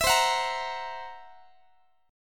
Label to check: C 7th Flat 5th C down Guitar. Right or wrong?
Right